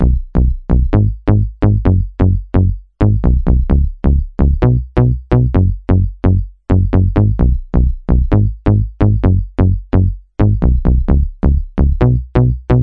bass, yamaha tx81Z

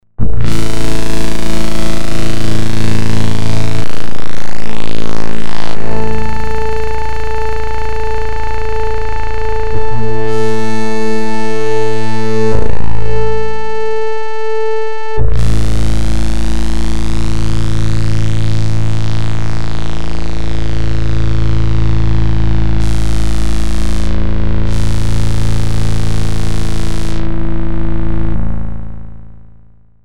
synth; Emu XL1 Xtreme Lead

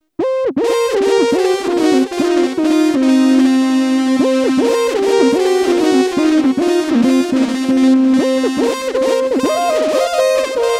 synth lead delay, yamaha sy99

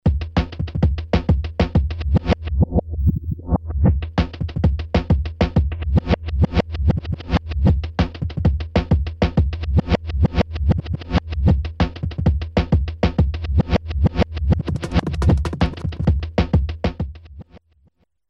drum jungle, akai x 7000

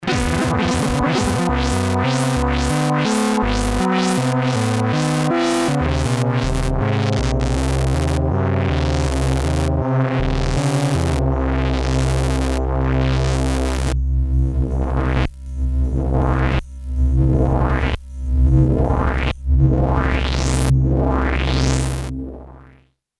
synth dodeca, sample and hold; FX: sample and hold; Polivoks formanta

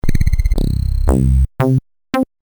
amiga digdug; commodore amiga

